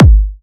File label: Kick, Techno Drum Shots; Drum One-Shots; Ghosthack; Free Techno Drum Shots 2024